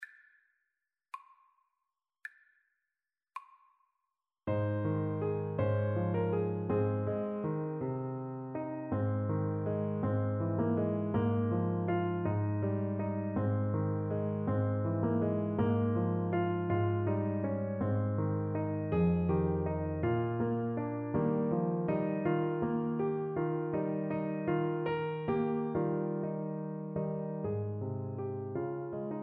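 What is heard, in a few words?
christmas song, alto saxophone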